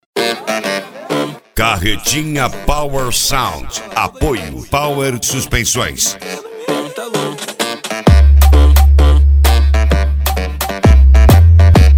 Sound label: mega funk; carretinha power sound volume 2